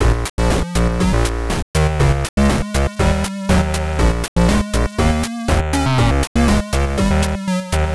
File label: music